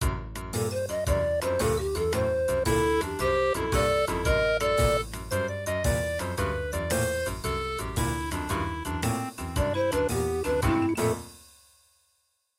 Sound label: MIDI Ending